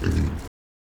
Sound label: Wild boar Neg Low